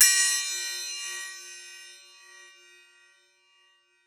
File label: Acc Cym; Roland SPD-S